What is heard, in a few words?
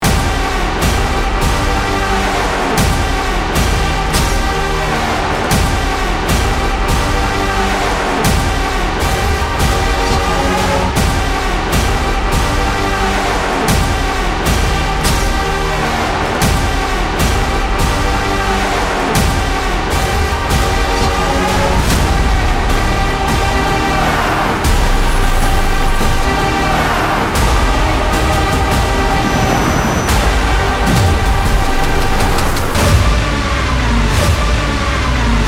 Trailer BGM Mix; Prabhas’s Salaar Ceasefire Trailer BGM